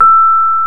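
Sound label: piano Rhodes Mk.V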